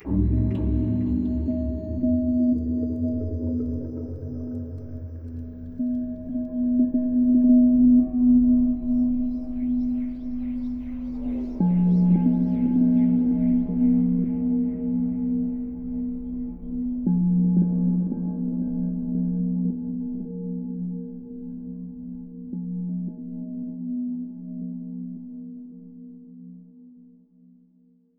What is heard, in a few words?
Stinger Night; Peak (2025)